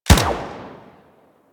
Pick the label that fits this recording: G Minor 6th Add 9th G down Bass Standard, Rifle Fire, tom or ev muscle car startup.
Rifle Fire